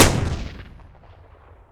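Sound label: grenade explode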